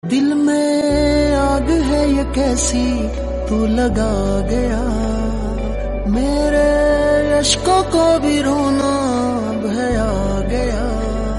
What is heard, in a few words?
male version